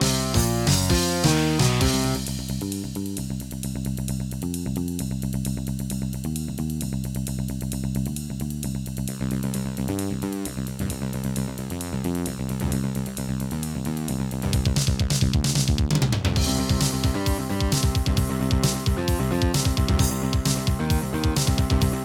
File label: Yamaha MU5; sigil doom episode 5